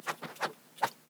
Wooshes; Organic; Micro Pack

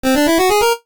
8-Bit jingles; NES; kenney; music jingles

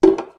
wooden

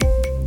Boom, Drums; BB 2HTC Samples Vol 4

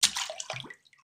splash; water splash slime sfx

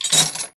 metal; RPG SFX

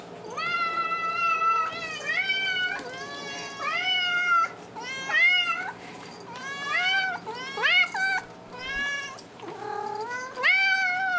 Cat Meows - Feeding Frenzy; Micro Pack

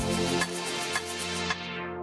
Supersaw Lowpass; BB 2HTC Samples Vol 4; Pads

